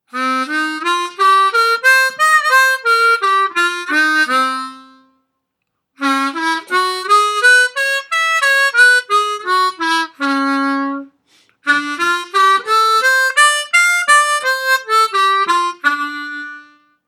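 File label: C Db D Pentatonik Scales, HARMONICA; Seydel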